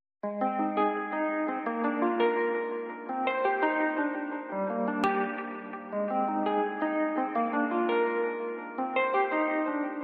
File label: song instrument ringtone